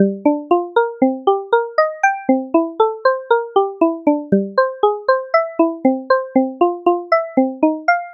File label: piano jazz; open piano